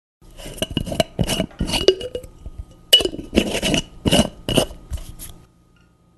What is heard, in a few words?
Jar Lid Opening